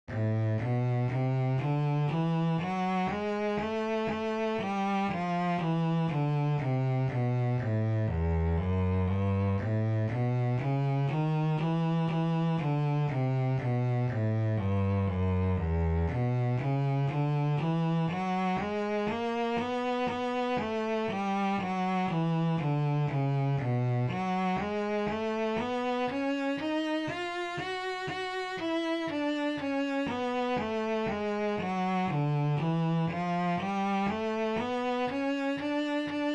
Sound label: Seven One Octave Melodic Minor, Bass; Scale